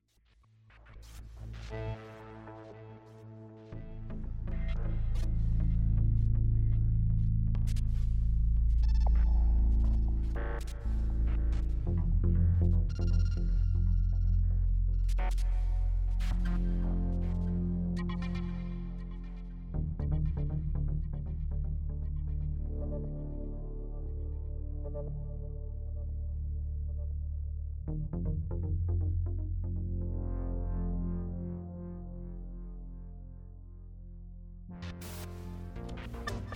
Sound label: Soundscape; Inselgalerie